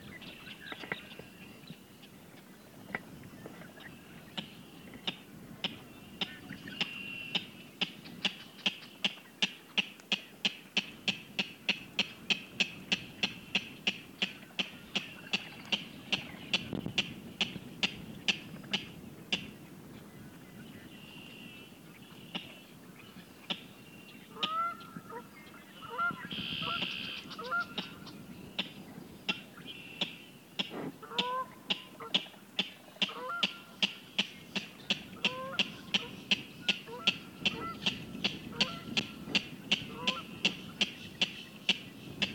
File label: King Rail kek call recorded at Mackay Island NWR